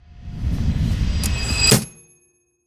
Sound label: Riser (Opening)